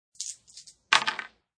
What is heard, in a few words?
Roll of the dice; Dice (Sound Effects:Casinos)